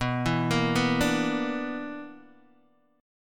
B-Minor Major 7th Double Flat 5th B down Guitar DADGAD